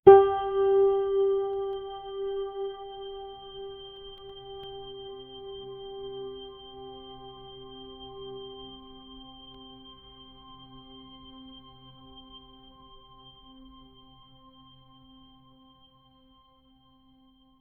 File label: japanese spring sound sof